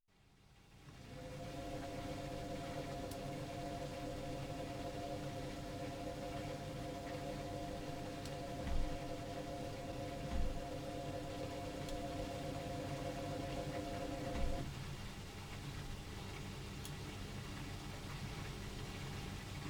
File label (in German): Waschmaschine